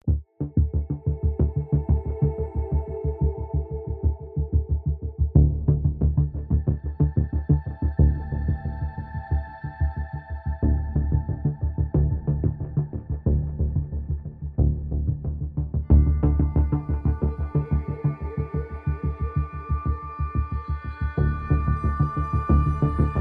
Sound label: Bed Mix